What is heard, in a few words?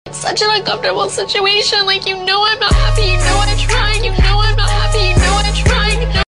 crying; vine